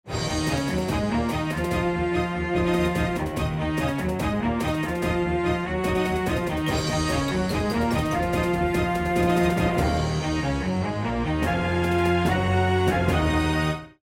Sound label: choose team; music kits custom